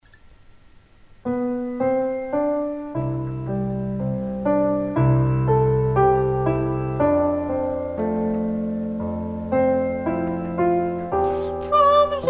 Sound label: danny boy hommes